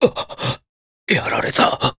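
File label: Vox Dead Japanese; Nicx; kings raid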